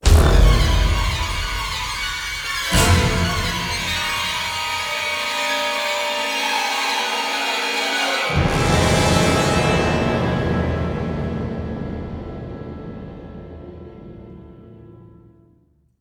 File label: Horror Stinger; rise of nightmares xbox 360 gamerip 2011